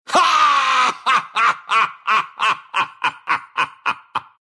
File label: laugh, ru; dota2